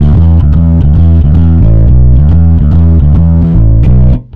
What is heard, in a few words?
Distorted E, bass; Effected; Sonic Foundry; Bass X